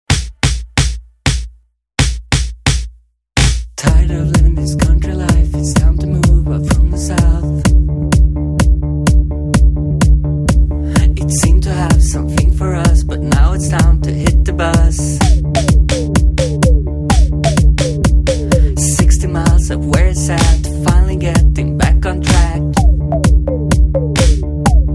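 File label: lofi funk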